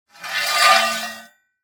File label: Impact Street Sign Drag On Cement